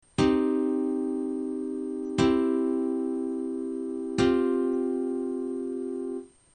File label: Cm C Csus4